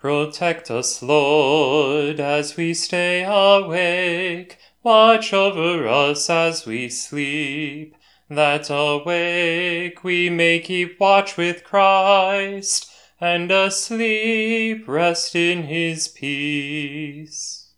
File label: canticle antiphon